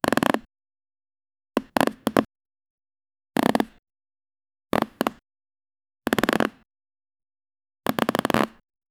polishing cloth rubs; workshop

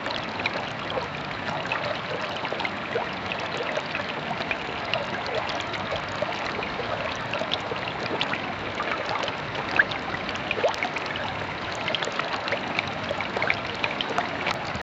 quick water splashes as f; animals

